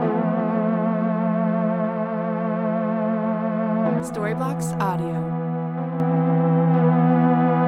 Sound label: Ambient House Chords 125 BPM F